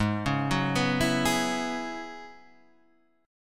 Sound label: Gb 7th Suspended 2nd Suspended 4th Ab